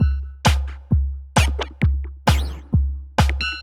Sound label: loops drum pattern